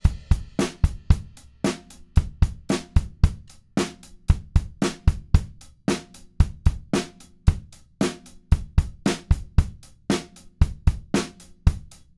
ADA8000 S DRUMS; Stock Behringer ADA8000; a Shure Beta 52 on the kick and a Shure Beta 57 on the snare; Revive Audio

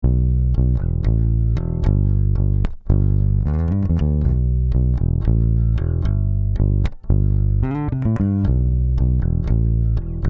Bass 160x vca; Modified DBX 160x Standard Modification With Optional VCA Upgrade; Revive Audio; Captured with a Lucid 88192; Bass Guitar • 6 db RMS Gain Reduction, 8 db peak • Threshold: -10 • Ratio: 6

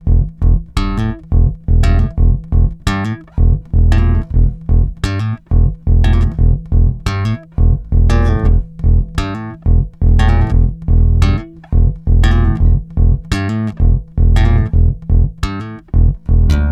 STOCK Bass Comp; Stock Golden Age Comp-54 MK II: BASS; Lucid ADA88192

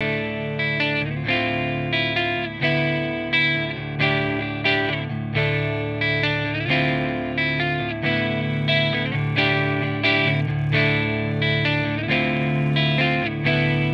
GUITAR STOCK RIBBON 10K BOOST; Stock Wa73-EQ On Clean guitar; Oktave Ml52 Ribbon mic with a 10K boost on the preamp set to 3 o'clock; Revive Audio

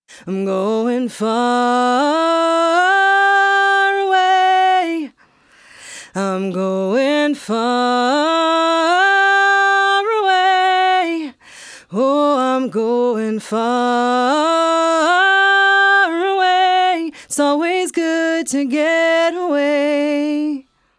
Female vox; Female Vocals; Modified DBX 160x Standard Modification With Optional VCA Upgrade; Revive Audio

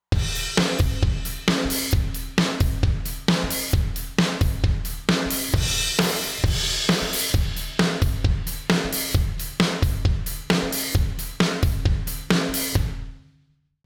DRUMS TURBO

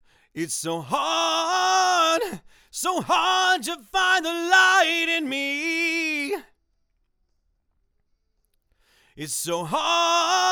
VOCAL STOCK; Stock Wa73-EQ on Rock Vocal; Revive Audio

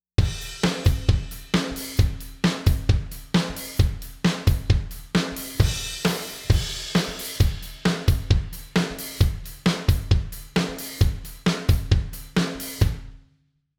DRUMS BYPASS

